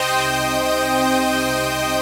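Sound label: chord pad, Pads; Best Service; XX Large - Pads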